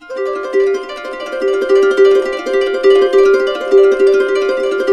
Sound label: harp, -R; Complete Harps; USB Soundscan vol.62; AKAI